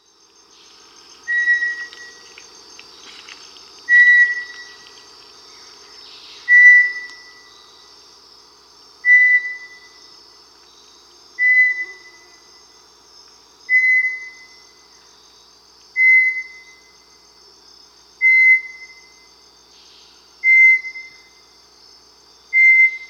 Bartlett's Tinamou, Crypturellus, Nature's Songs, S. America